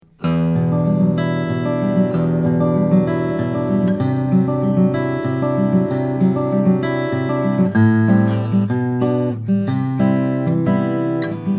zimnicki steel string, steel-string flattop guitar; om